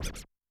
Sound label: Scrach; Yamaha DTXpress-II; Perc